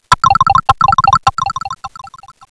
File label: drop